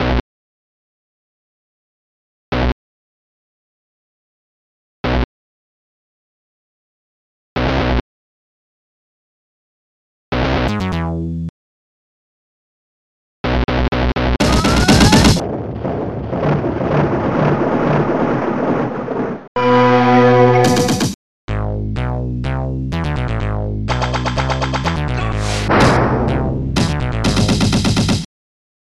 bomb; Cockpit Murmers

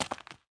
gravel; TS2HomeCrafterPlus

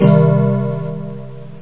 Chime Min

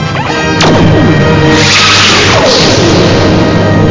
torpedos

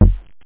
stick

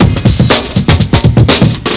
breakbeat; algomusic; rhythm

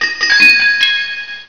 Fairy Wink

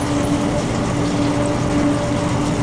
Large Door Mid; Gunlok

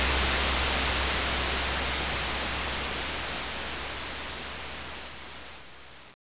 bomb

